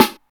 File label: piercing snare single hit a sharp key, snare tuned to the A# note